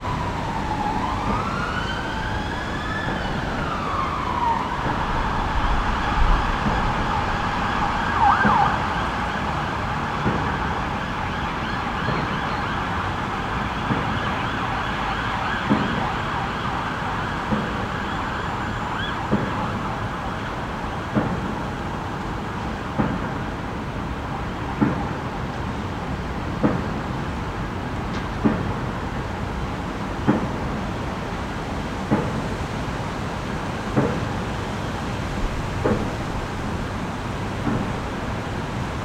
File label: ambience city london uk sirens distant constant construction pounding wind trees